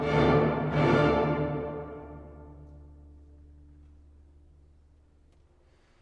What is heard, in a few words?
Music Dramatic Orchestral Ending